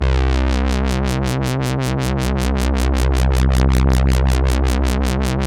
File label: SYN WOBBLE; AMG - Now CD-ROM (Roland); Megabass 1